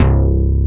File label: FMPCM